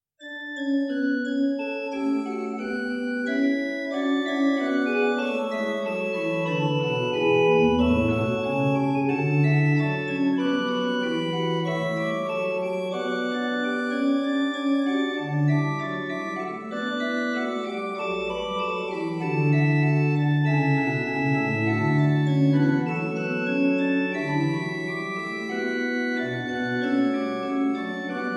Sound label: Prengel, pipe organ